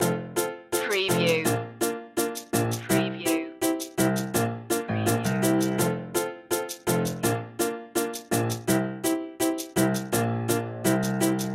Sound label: spring steppin loop